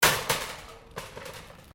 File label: Trolley Shopping Supermarket Swing Close, Shopping carts